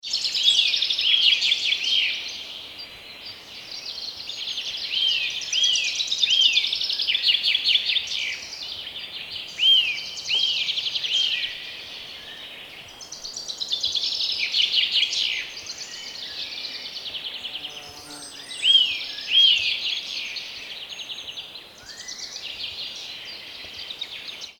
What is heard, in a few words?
Birds in the forest early spring